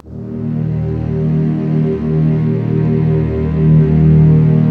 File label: sounds music; Halo Combat Evolved; Xbox